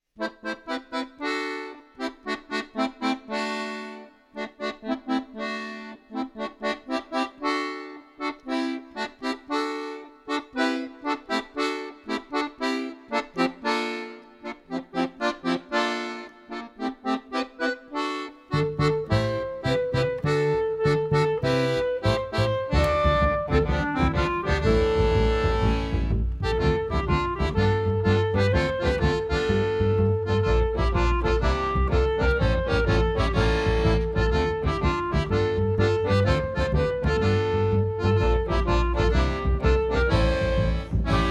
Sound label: playbacks; Barouf au musée